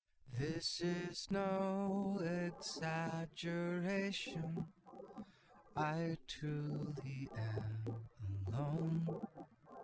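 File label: with drums instantaneous source; SiSEC08; SiSEC underdetermined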